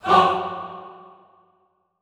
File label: HAH, Classical Choir; Best Service